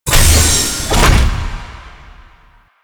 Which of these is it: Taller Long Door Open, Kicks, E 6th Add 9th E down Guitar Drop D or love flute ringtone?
Taller Long Door Open